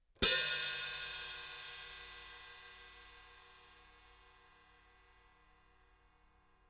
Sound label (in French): H-H chick resonnant; cymbales